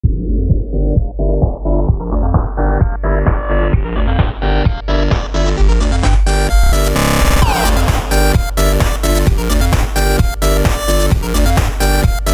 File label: disco for 8bit people; weeklybeats-2018